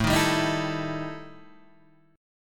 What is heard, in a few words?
E Minor Major 7th Double Flat 5th